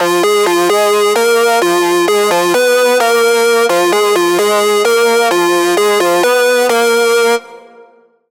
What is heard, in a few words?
Lead; lead the way; Routed Sound; Spire Expansion - Hyper Gamer Vol.1